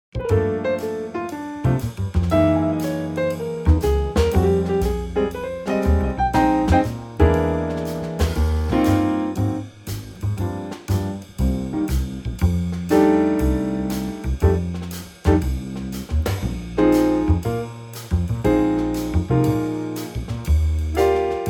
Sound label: Trio Eb